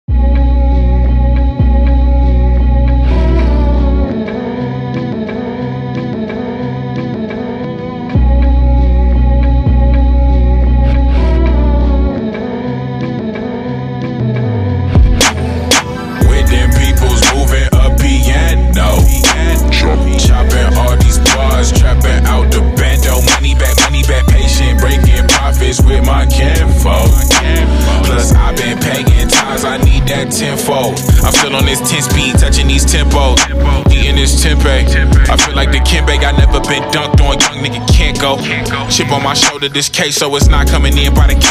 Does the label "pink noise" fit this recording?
No